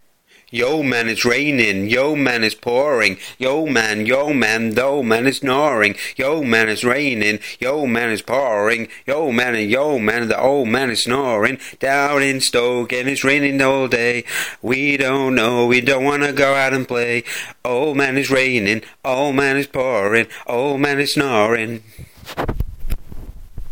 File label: raining and pouring